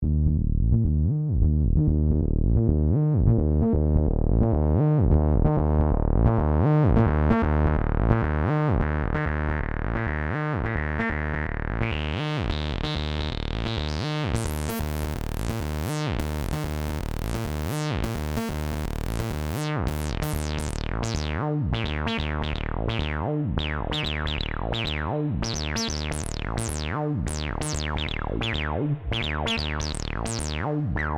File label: toneburst EX 303; EX-800 With Hawk-800 Mod acid bassline example 01.; Korg; HAWK-800 upgrade kit